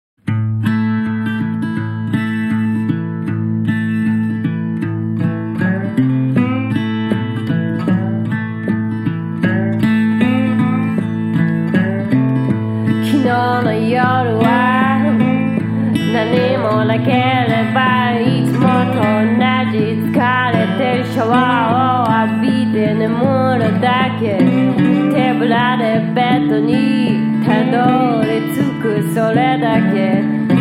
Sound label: tone